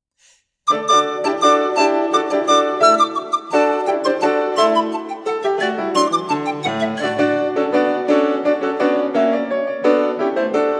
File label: Sonatina for flute and piano - Allegro con brio; Pal Jardanyi